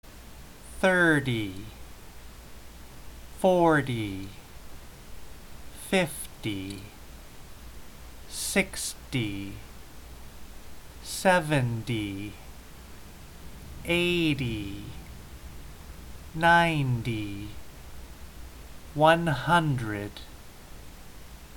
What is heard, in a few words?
slow